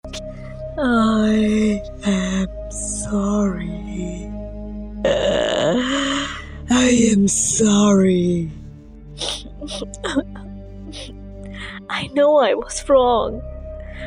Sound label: creepy girl singing i m sorry scary horror voice